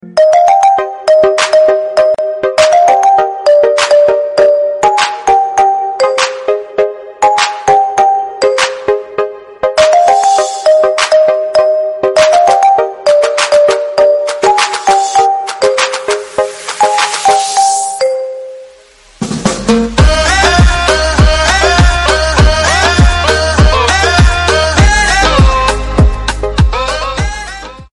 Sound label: Mobile Ringtone